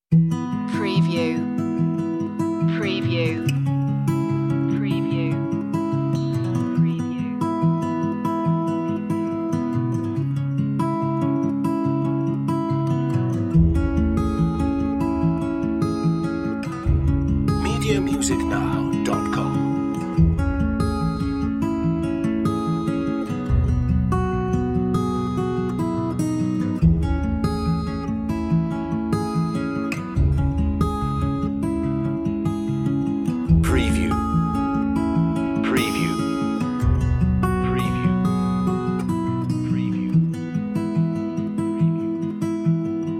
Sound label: under the open sky underscore